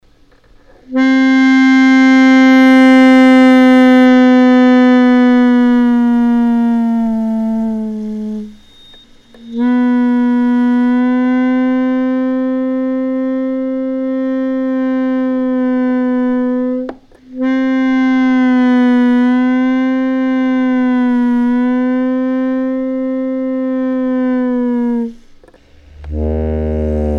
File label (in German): pitch real